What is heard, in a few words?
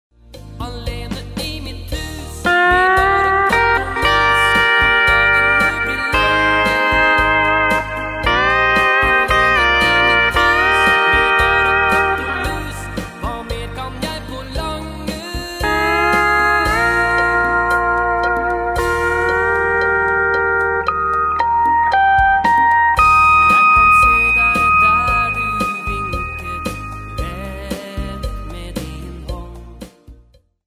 steel